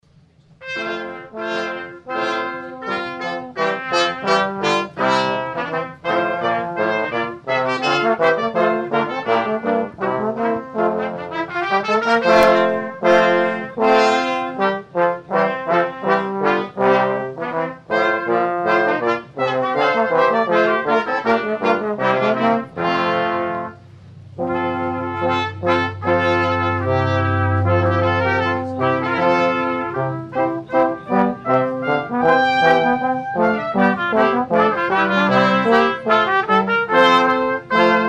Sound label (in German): Turmblaeser song; aargauer Turmblaeser